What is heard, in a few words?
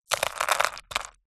food dried chilies drop into plastic pot; zapsplat